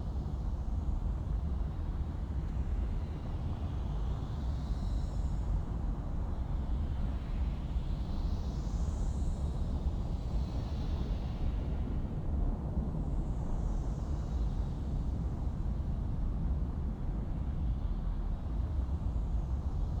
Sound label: ambiance; Rifter; minerva